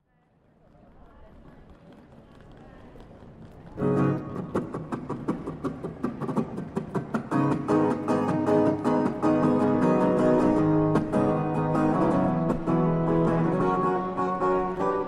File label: street music glasgow